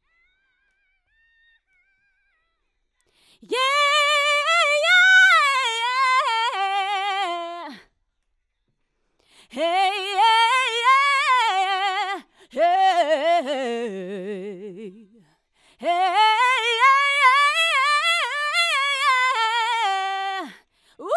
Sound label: Soul